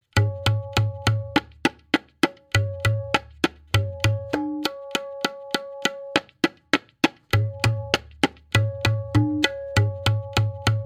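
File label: Variation 6-Played, Benares Kaida 1, Tintal; Benares Kayda 1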